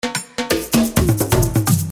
Drum Fill (128 BPM)

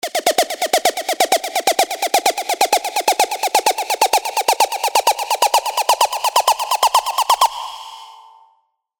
Uplifter; SERUM FX

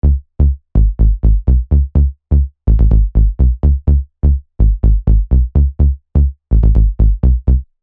Bass Loop D (125 BPM)

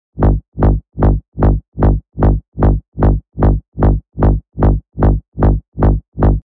Reverse Bass Loop G# (150 BPM)

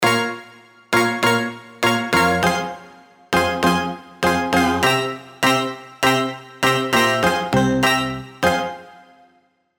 KS Flamingo Tripper, SERUM KEYS

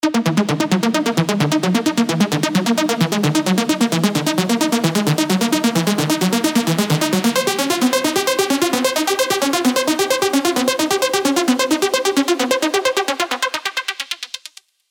Tech, Serum arps

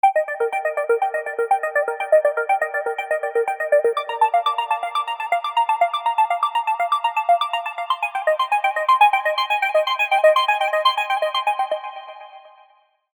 Serum arps; More Feelings

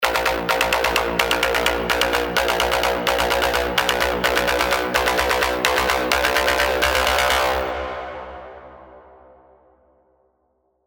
Tonal Risers (128 BPM) 4bar A#

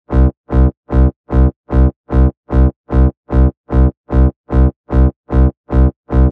Reverse Bass Loop C (150 BPM)

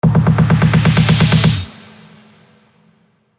Drum Fill (128 BPM)

